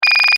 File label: Sci fi laser loop, Laser: Firing Scifi Style; Lasers; Stuart Duffield